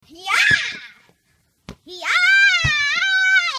kid gets hit with a basketball

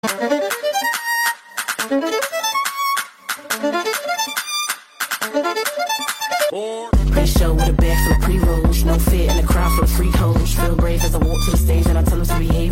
violin; genshin

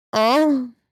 happy sound reaction

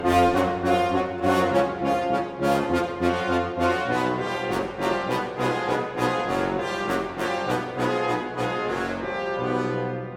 funny brass section

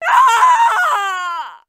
impaled scream; mk9 sindel

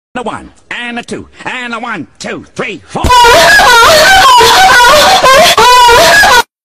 girl moaning; vine

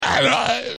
zombie attacking; zombie shooter 2

